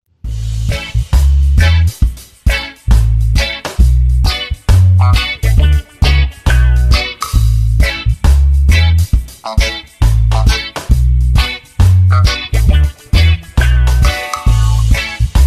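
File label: jamaican reggae ringtone; yamajskij reggi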